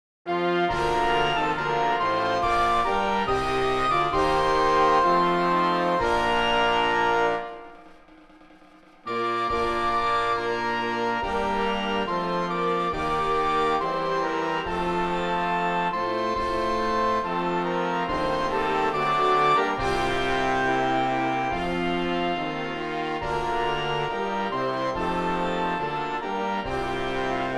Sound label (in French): hymne national